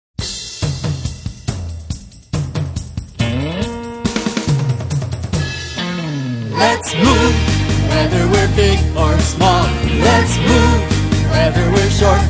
Vocal

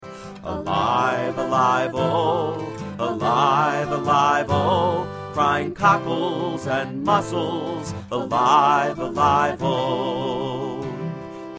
cockles and mussels vocal, folk song

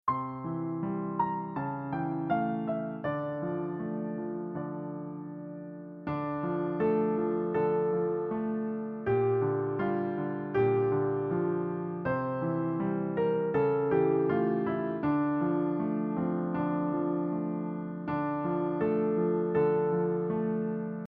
instr; All the Pretty Little Horses

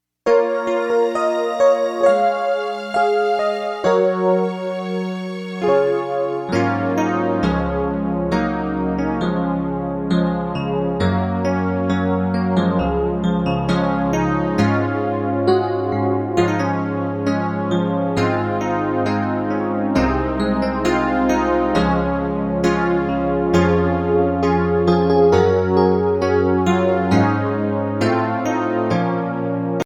instru

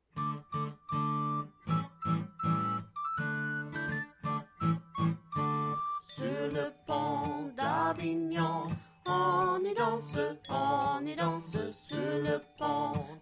sur le pont d avignon vocal; French

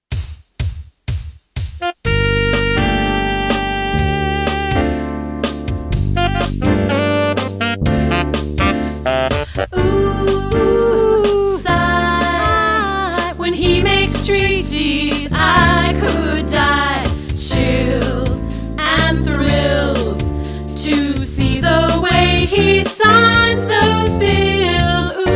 ooh sigh; us presidents; musical play

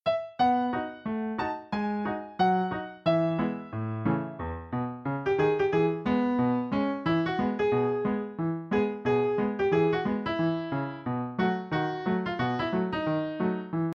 instr; Down by the Riverside

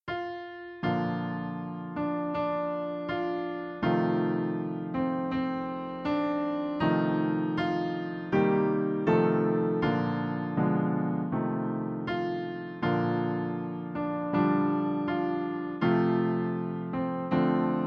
instr in Bb flat; America the Beautiful

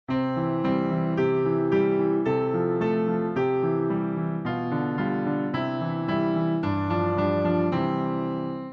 la chanson de l alphabet instr; French

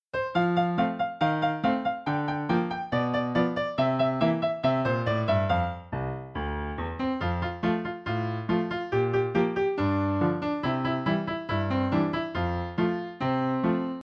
instr; Catalina Magdalena; folk

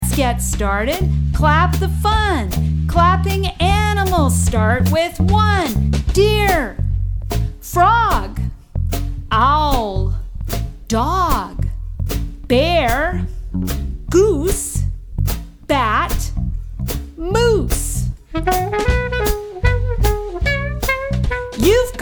Songs for Teaching; without children singing the echo

